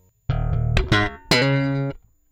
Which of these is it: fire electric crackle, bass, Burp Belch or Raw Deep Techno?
bass